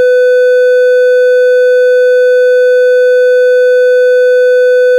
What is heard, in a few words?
sinewave 20 percent thd